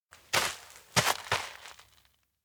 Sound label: steps outdoor ground step forward; rikka; anemoi